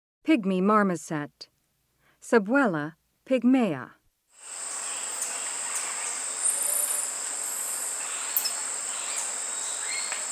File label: Pygmy marmoset; S. America; Rainforest; Primates of the Rainforest